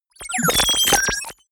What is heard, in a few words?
confirm crazy, signale; soundpool